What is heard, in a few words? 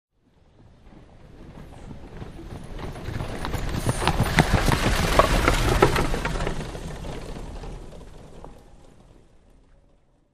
Carriage By Fast